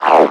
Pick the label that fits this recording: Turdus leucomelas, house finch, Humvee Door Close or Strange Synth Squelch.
Strange Synth Squelch